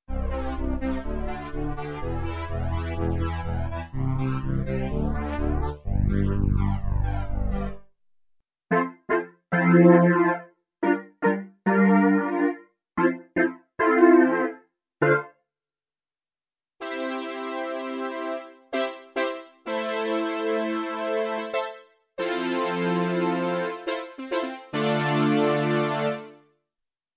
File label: Nord Stage 2 Synth